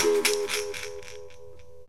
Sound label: BERIMBAU; OMI - Universe of Sounds